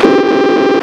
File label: recorded first shot; ced7000